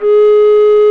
RECDR B; Roland - LA Composer vol. 1

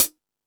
Lazer Drums, E-MU Pro-Cussion; drum machine

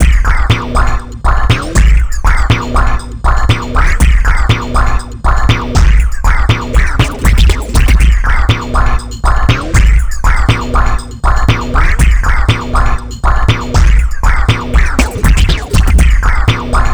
TRIPHOP; INIS; Funky Xtreams

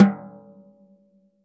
snare - snares off; JazzFunkKit

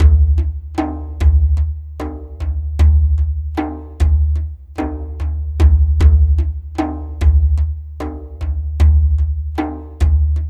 Dumbek Slow, Ethnic Percussion; Sonic Foundry (Sony Creative Software); Ambient Atmospheres & Rhythms

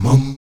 VOX MUM C#3, Afro Chants; Roland LCDP11 Africa VOL-1; Stacc Mums

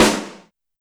Snare Power, YAMAHA RM1X

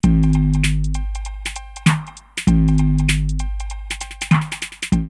808 Deeccaayy 98, drumloops, DrumMachines; X-Static Goldmine

